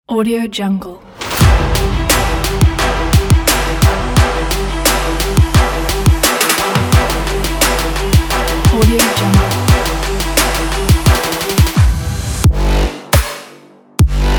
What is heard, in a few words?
power trap